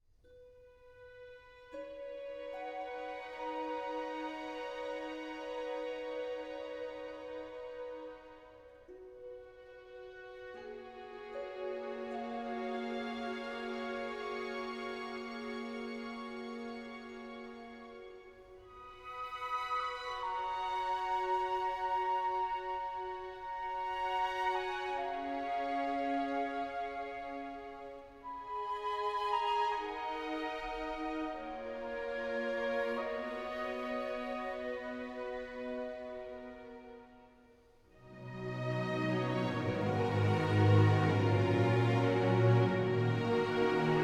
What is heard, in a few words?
Starlight Full Mix 76bpmvar 4-4